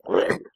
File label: Hurt; Amanita